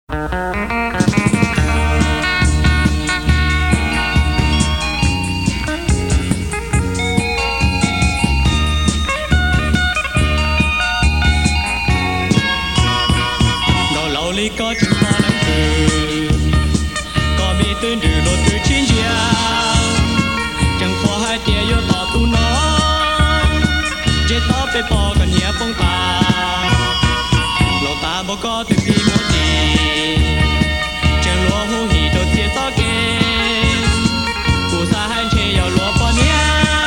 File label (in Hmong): nkauj laug